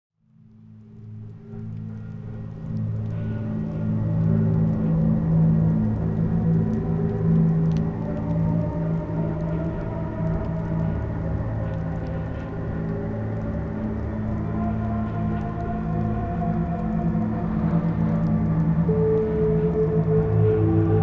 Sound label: sound ambience sonido ambiente